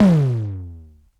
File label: Sub37 Noise Tom Hi Vinyl Synths C3; vinyl perc